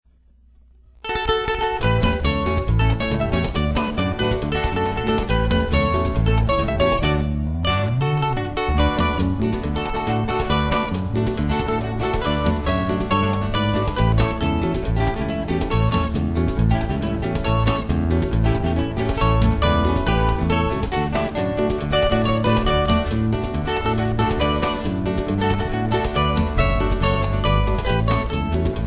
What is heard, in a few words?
acoustic guitar